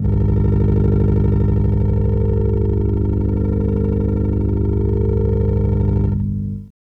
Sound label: ALIEN ENGIN